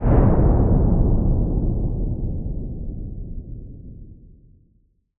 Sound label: distant explosion